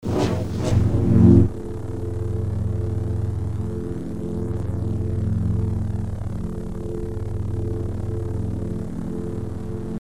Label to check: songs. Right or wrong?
Wrong